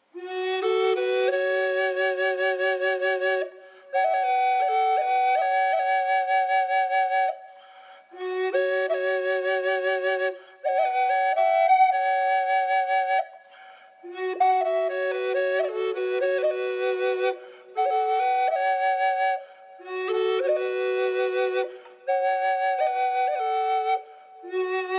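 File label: Fiddleback F-sharp minor wet, flutes drones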